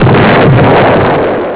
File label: landmine